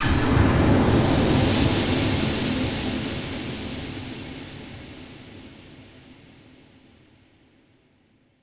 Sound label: Huge Verb Tail, Long Reverb Tails; impact samples